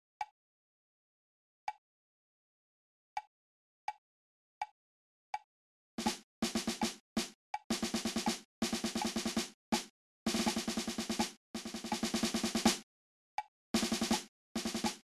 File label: Perc; district band